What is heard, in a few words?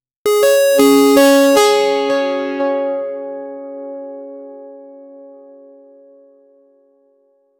the single perfect note r, single note